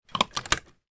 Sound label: clothing item on hanger put into wardrobe; foley